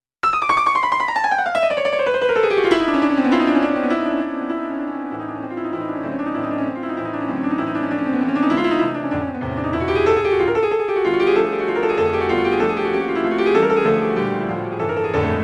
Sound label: bumblebee, piano